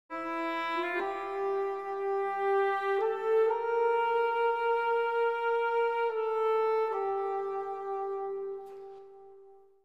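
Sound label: melody; Myst III Exile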